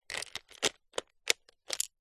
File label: gemstones inside plastic display case movements; zapsplat